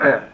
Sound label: hurt; FPS Kit Version 2.0